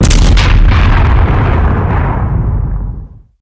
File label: meteor explode, weapons